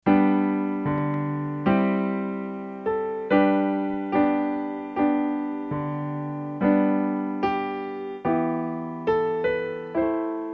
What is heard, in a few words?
TomTom